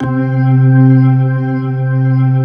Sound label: C3, Keyboards Old School; USB Soundscan vol.09; AKAI; BIG HAMM